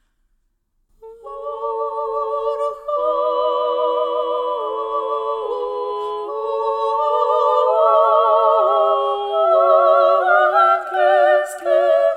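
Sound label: trio of singers, church; Aleksandro-Nevskij hram g.Riga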